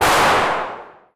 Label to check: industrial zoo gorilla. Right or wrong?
Wrong